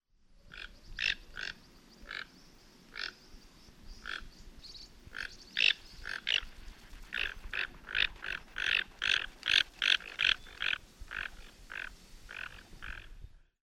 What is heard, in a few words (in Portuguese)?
Tucano-toco Ramphastos toco; Jacauna